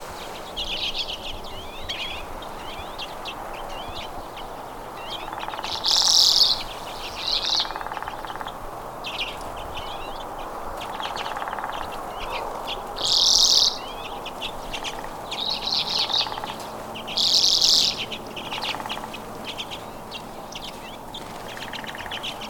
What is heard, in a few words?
Common Redpoll, Acanthis flammea; finches